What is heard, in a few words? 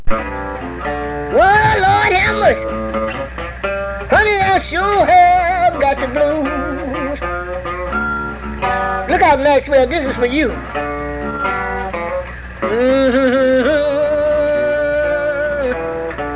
moan